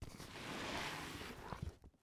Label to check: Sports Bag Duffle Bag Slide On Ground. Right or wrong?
Right